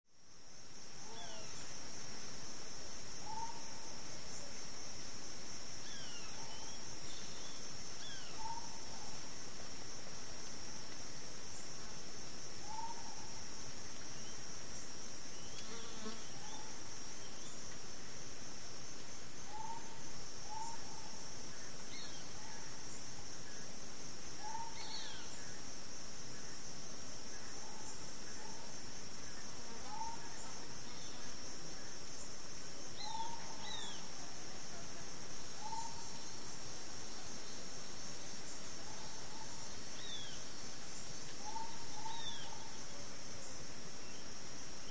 Steady Exotic Bird, rainforest

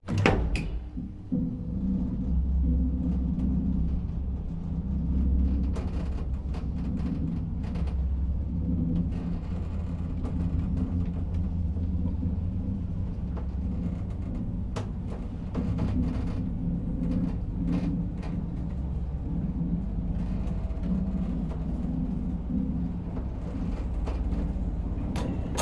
Elevator In The Booth, Run; hinges & creaks

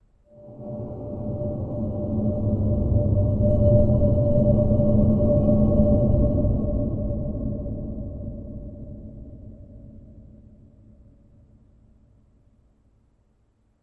Pipe Drag Through Reverb

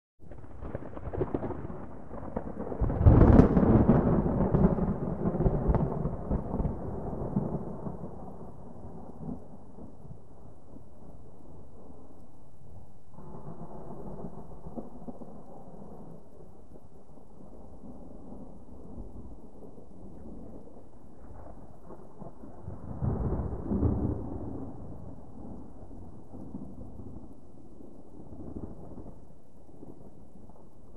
Thunder Hard Low, distant

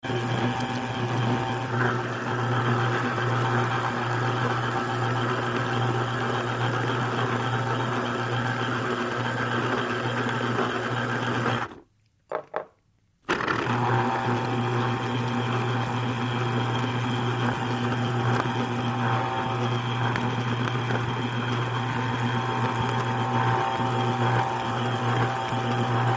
Ambiance Machine

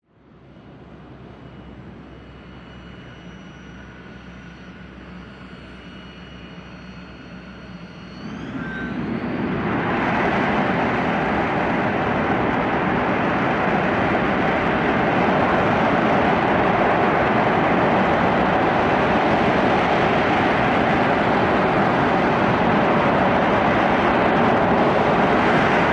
A-6 Intruder: Power-up And Takeoff; Grumman A6 Intruder jet attack bomber, high flyb

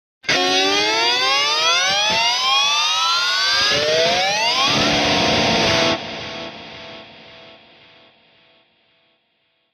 Elect Guitar Scream, electric guitar

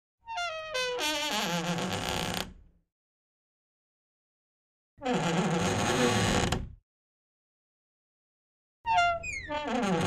Door Wood Creak X3; hinges & creaks

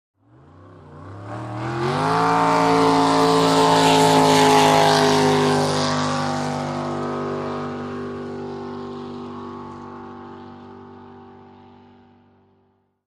Drag Boat Start by

